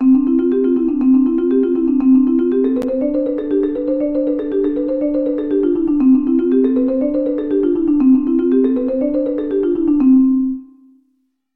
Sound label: Scales; Kraus